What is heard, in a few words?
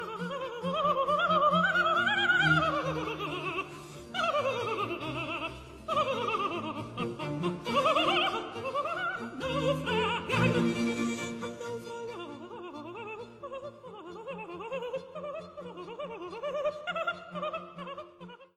Mezzosoprano; Cecilia Bartoli